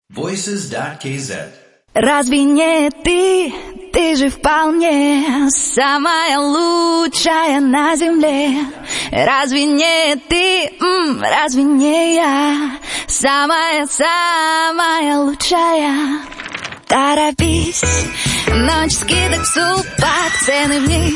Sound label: vocal ru